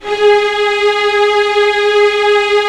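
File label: string section; Roland - LA Composer vol. 1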